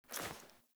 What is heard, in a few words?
Foley Walk Default, Foley Default Clothing; Characters; Player